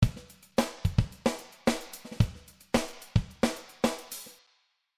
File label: Drums SN Verb